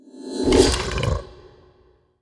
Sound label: bone dragon spawn growl; halloween